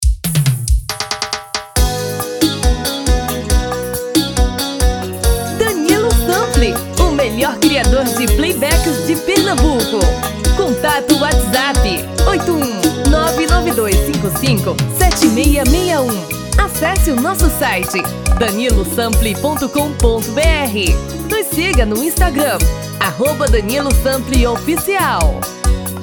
Seresta um tom e meio acima

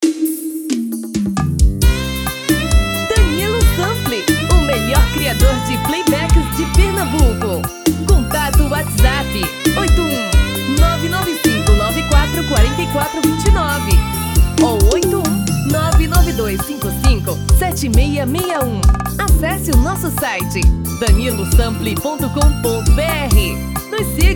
arrocha

